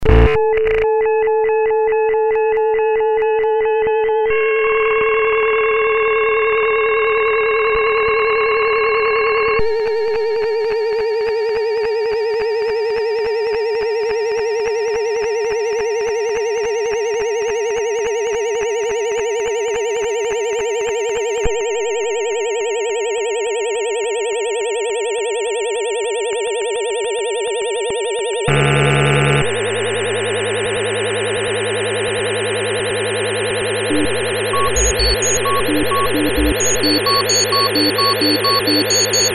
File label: modem weird